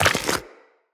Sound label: Step Goo, Footsteps, Walk; Characters; Tenno